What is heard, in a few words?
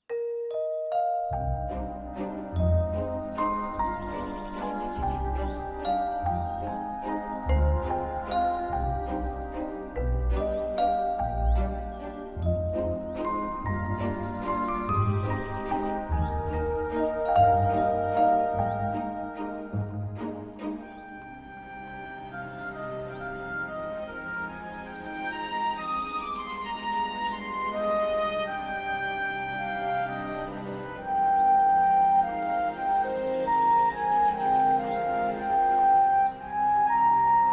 Waltz; Ponytown OST